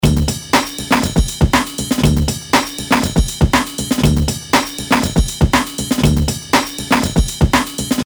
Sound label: Beat Comp Spectral; SmartComp